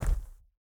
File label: bear footstep wood hard; Rust